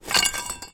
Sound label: metal pole drag scrape rubble